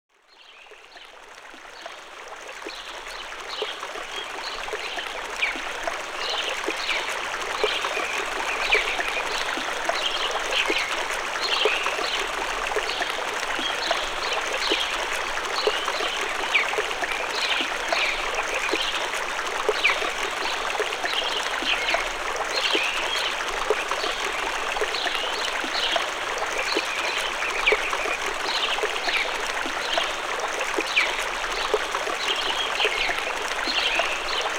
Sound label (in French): Ruisseau Et Oiseaux, Bruit Blanc, Sons Nature